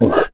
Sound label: roar